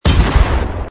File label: Fire; chengbao